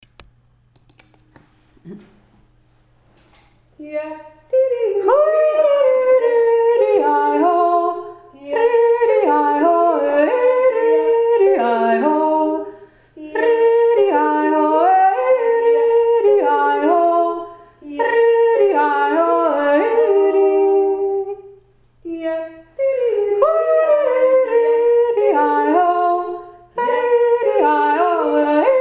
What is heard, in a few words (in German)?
laufnitzer beide stimmen